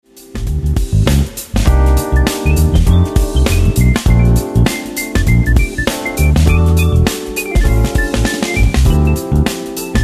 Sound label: Keyboards